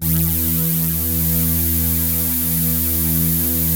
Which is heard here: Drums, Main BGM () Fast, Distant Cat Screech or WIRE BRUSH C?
WIRE BRUSH C